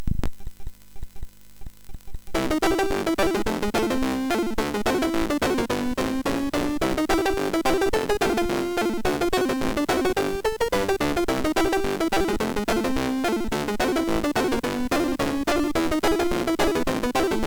String, MOS6581R4; hvsc

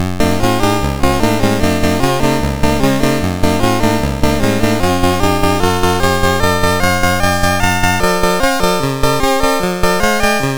Willow Pattern, MOS6581R2

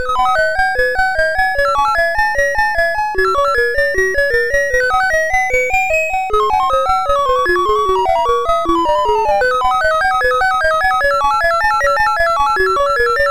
sid MOS6581R4, GAMES; Chinese Juggler

